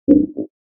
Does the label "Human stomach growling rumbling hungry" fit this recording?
Yes